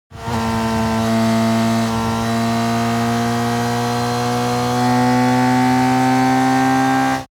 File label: Racing motorcycle engine; xe dua